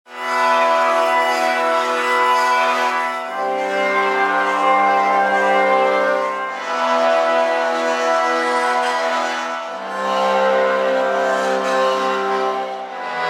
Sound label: Omni2 shift